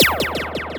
Zapps, sci-fi

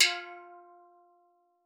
F3, STEELDRUMS; Xsample VOL-4

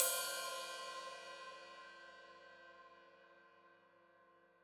Hat Open, Hi-Hat; Cymbals Stereo; Deeply Sampled Drums

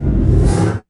IMP FLAME, Cinematic Impact; M-Audio Pro Sessions; Liquid Cinema

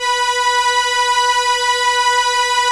STR ARP, ARP Strings, ARP Slow Str; Roland LCDP09 Keys of the 60s and 70s 1

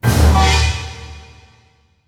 HIT, full orchestra; Orchestral Loops; FULL ORCH ACNT

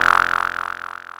kit, Yamaha Motif-ES8

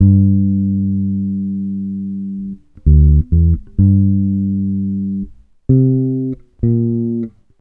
FEAR G, Bass Grooves; USB Soundscan vol.30; AKAI

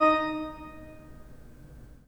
PIPE, 3DOrgans

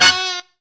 TRUMP HIT; Zero-G; Funky Elements

